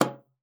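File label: C#3, bass flute; Xsample VOL-2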